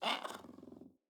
Kitten Speak Playful; Enemies; Catbrow

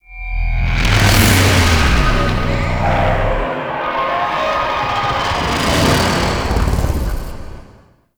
Trench Stinger; Intro Battlefield

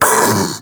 Infested Splitter Split Vocal Pain; Warframe; Grey Strain

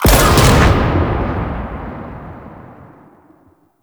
Pylon Proj Fire B; Enemies; Weapons; Warframe; Arachnoid Camper

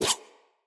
Dagger Whoosh Heavy; Sinecure Dagger

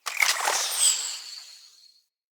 Bow Arrow Projectile Start; Sinecure

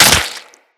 Dagger Flesh Impact; Weapons; Dual Daggers; Hit Effects

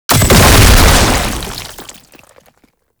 Death Explosion; Warframe; Lasrian Soldiers

